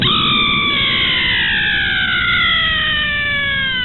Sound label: scream